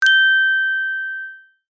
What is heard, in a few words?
multimedia notification bell chime ping tone high pitched